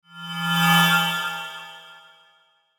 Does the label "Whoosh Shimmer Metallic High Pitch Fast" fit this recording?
Yes